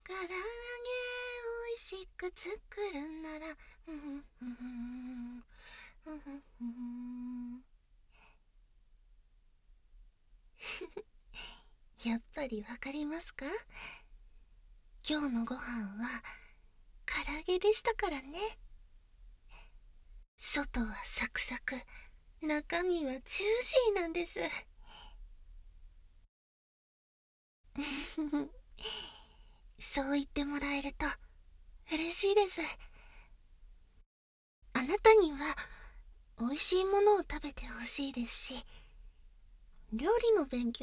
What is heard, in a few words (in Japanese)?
耳ふーの実験だそうです, ASMR